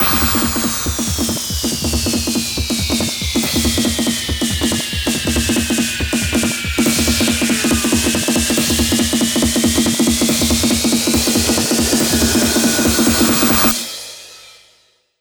140BPM 8Bars, HandsUp & Trance Fills; Penny; Vengeance Samples

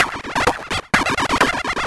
VFX2 Loop 128 BPM

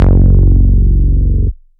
Ultimate Bass Root F#; Vengeance Samples

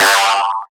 Synth Sound; Vengeance Samples; VEE Synth Shots

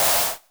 Clap; Vengeance Samples; VEC1 Claps

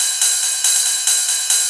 Loop 140 BPM; vengeance essential club sounds-3

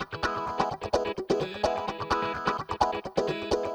Electric Guitar 128BPM E maj; Vengeance Samples; VEH3 Electric Guitar Kit 2

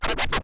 Cuts, Vinyl Record Samples

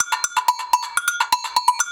mix percussion loops, 125; Vocal House; PS-28 Vocal House Samples

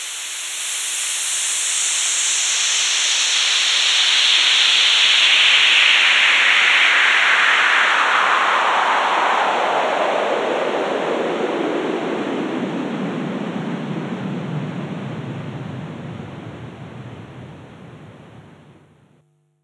Downsweeps

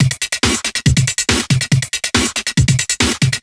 Breakbeat 140 BPM

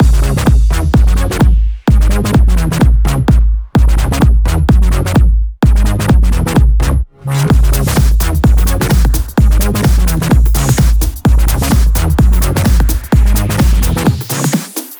128BPM Big Wobble Mix; Vengeance Samples

